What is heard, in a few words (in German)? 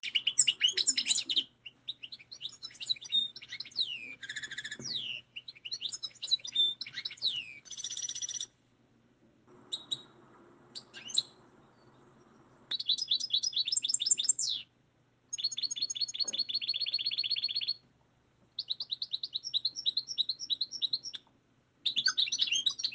Gezwitscher Stieglitze